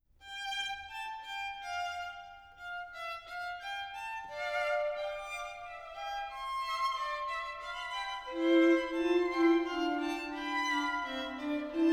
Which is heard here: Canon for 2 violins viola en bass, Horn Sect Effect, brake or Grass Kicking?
Canon for 2 violins viola en bass